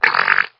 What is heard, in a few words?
fart short